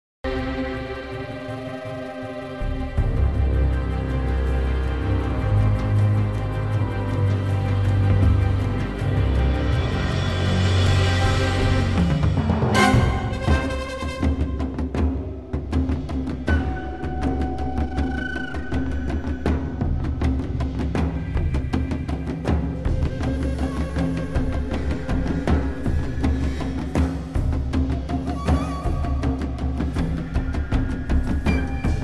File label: Fight Loop; Vof-Denahi